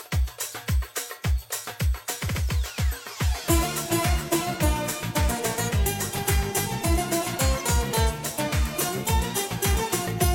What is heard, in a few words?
cover guitar